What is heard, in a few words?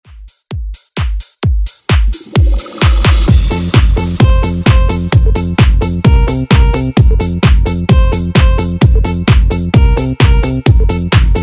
90s Dance Redrum